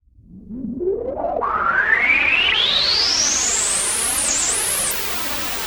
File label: Juno-60, Filtered Noise; noise hiss crackle